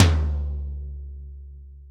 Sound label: TOM-TOM-L, ACOUSTIC KIT; Greytsounds VOL-1